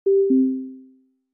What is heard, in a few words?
Message Received, Tokyo Train Station; Adium